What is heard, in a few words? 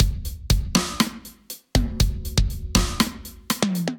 Kit, Drums Acoustic; Plymouth Kit